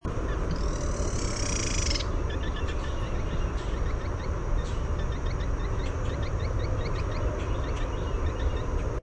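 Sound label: Carolina Wren chatter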